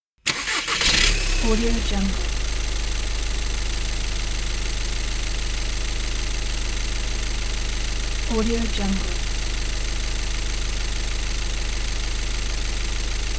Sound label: car engine start and idle; moonaudioproduction